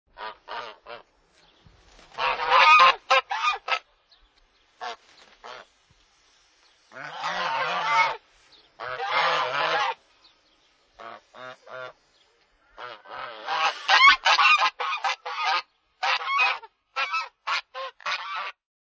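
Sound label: geese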